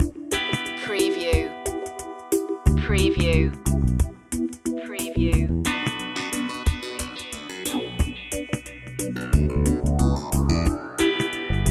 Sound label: dub loop